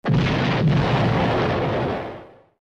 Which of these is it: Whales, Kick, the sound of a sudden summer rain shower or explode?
explode